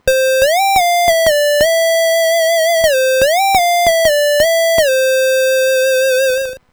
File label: port LFO